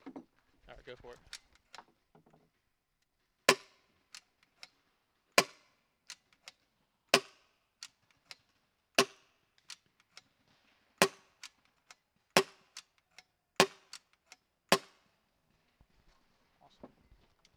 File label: pgp 4 shots short barrel 4 balls 4 dry, guns